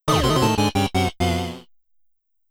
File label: Jingle - Bomb Defusal Miss; master detective archives rain code original soundtrack 2023